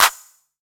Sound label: verby clap one shot d sharp key, clap tuned to the D# note. Loudest frequency: 4185Hz; single hits one shots